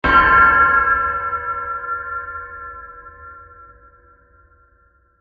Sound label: Large Metal Blast; Blasts; Impacts; Crafted Signals